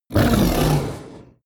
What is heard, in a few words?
coloss groan pain; kreaturen